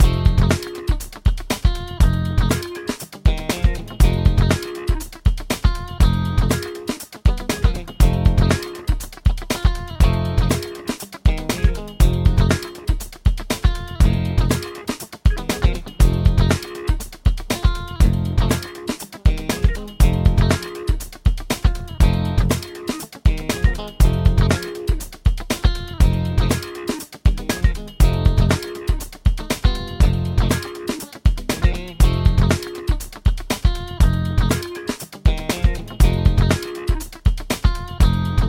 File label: solo loop